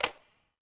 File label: bad walk, droids robots